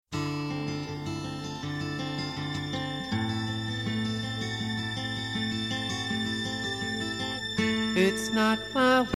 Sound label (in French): Arpeges